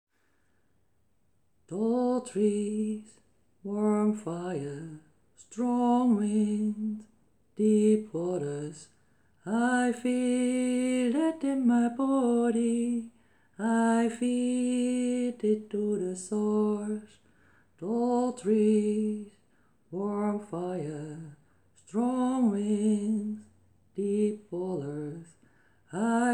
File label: Tall Trees Warm Fire